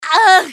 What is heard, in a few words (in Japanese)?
被ダメージ; ティビュロン